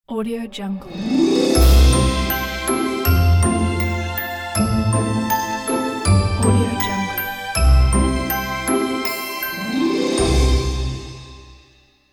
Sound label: logo